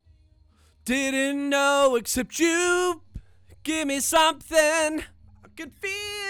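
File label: vocal; Pro Tools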